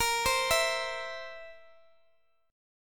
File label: Bb Suspended 2nd Flat 5th, Guitar, Standard; Bbsus2b5 arpeggiated; x,x,x,15,13,12; JGuitar